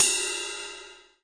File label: RIDE EDGE; Hip Hop; STYLE KITS (Hip Hop, Etc)